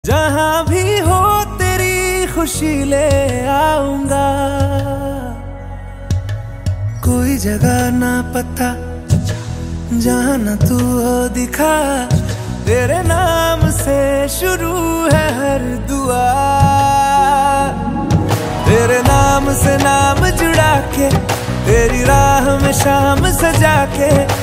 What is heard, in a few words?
Ringtone